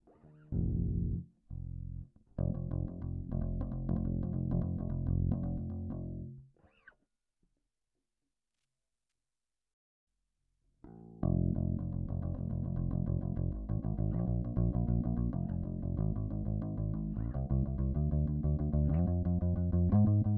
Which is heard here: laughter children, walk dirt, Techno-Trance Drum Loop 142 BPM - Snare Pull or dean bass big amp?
dean bass big amp